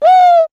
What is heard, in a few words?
"woo", male; ooh ahh; ho hoo woo